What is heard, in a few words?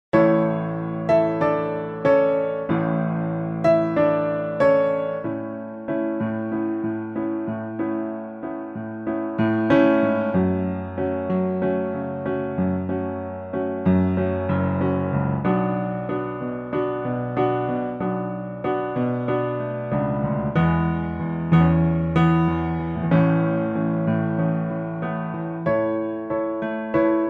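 piano track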